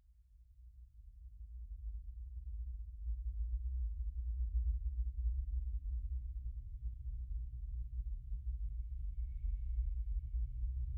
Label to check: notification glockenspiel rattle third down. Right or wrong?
Wrong